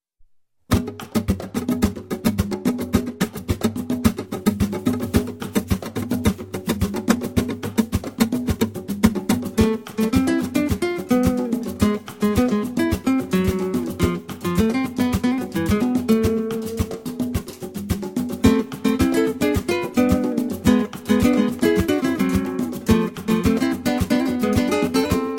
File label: Jazz Guitar